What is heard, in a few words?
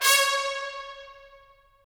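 brass trumpets staccato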